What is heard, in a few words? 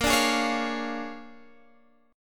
Bb 7th Sharp 9th Bb, Guitar Standard; Bb7#9; strummed; JGuitar